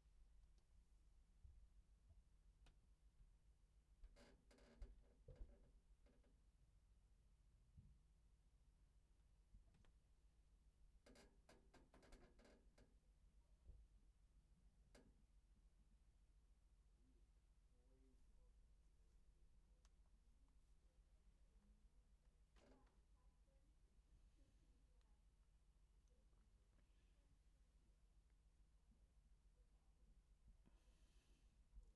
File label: Room tone